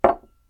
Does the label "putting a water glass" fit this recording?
Yes